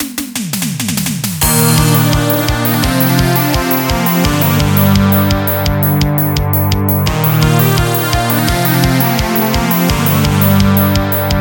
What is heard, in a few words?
eurobeat; AfterStory; Doki Doki Literature Club